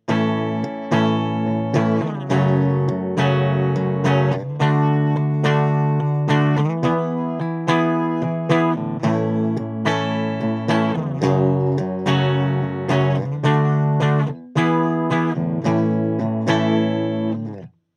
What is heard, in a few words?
Fender 25R, guitar